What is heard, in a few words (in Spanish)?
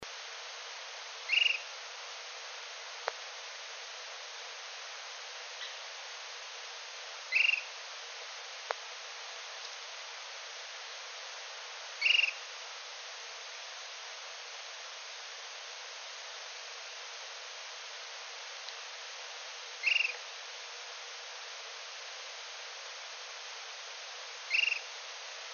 Burlisto Pico Negro, Myiarchus ferox, Short-crested Flycatcher; cantos